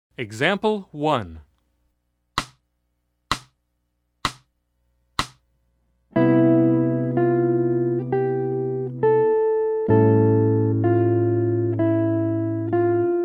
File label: Jazz; jue shi ji ta